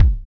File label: Kick, Kickdrum; Equipped Music; Loungin House